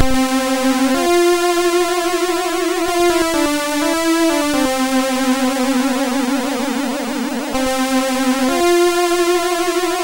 Music Demo

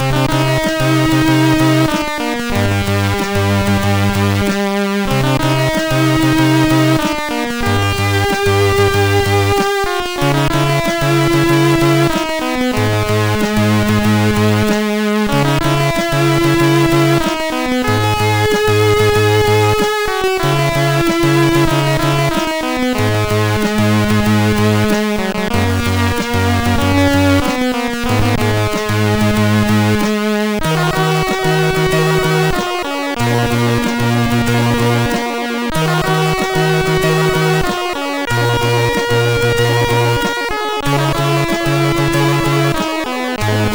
Sad End, MOS6581R4

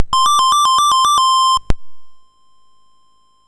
Dragonworld sid MOS6581R4

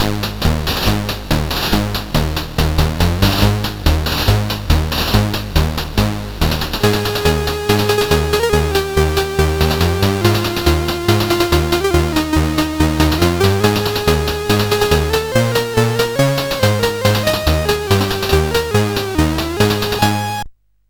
Paddle Mania, CSG8580R5

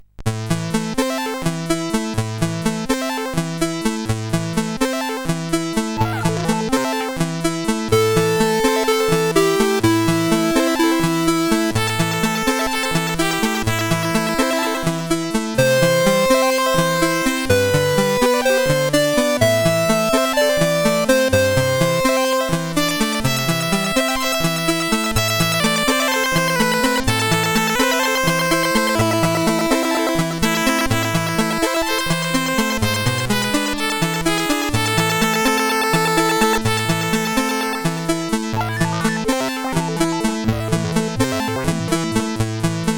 Pulsewave solo, sid CSG8580R5

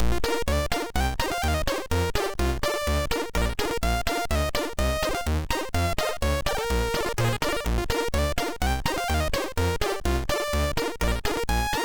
Guldkornekspressen Lvls, MOS6581R2

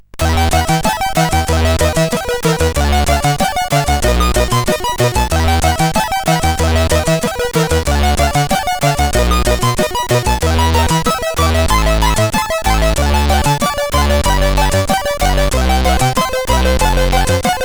Classical sid CSG8580R5

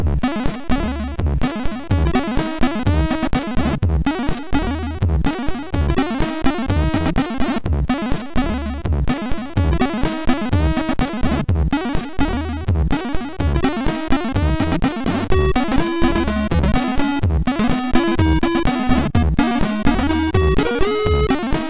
sid, MOS6581; hvsc

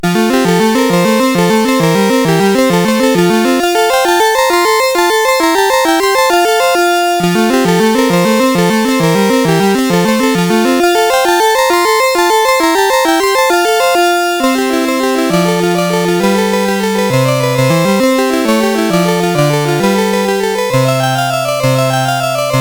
Allegretto, CSG8580R5

